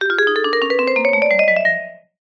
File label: cartoon ascend vibes